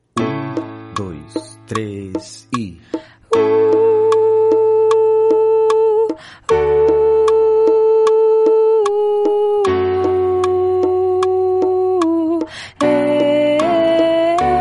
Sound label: soprano